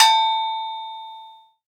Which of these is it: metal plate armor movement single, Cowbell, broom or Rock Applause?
Cowbell